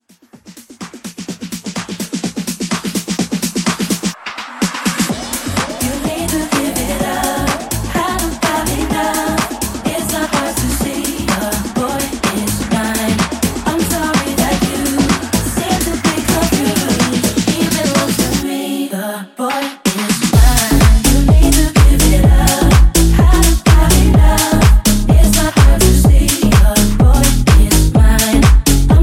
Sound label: EDM Intro; Remixfreakz